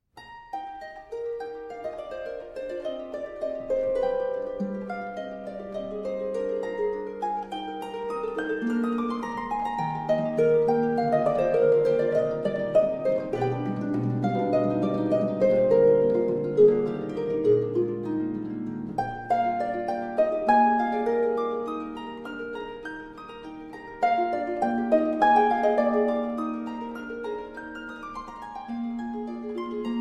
Sonata Op.34 No.1 E Flat Major Rondo Allegretto Moderato; JL Dussek